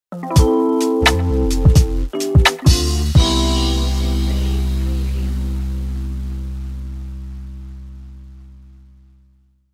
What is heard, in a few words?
Jazzy Lo Fi Hip Hop Logo